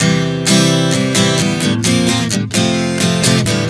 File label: C-Bb-F 130 bpm, acoustic guitar chord progressions